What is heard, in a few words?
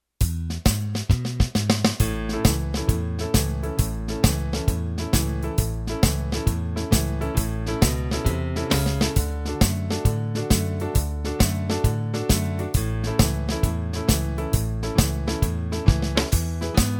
Blues Shuffle in A Fast